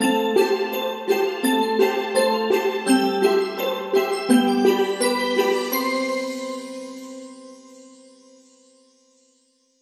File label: String and Bells Sting